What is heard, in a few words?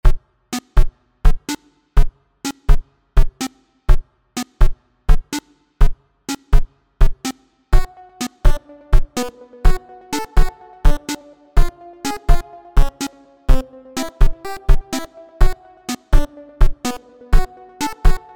main menu dark ruins